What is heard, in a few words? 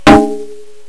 shimedaiko